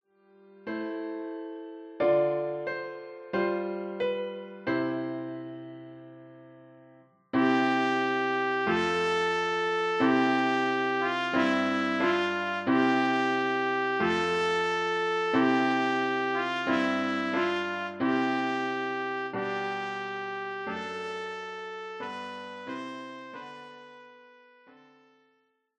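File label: Trumpet; O du fröhliche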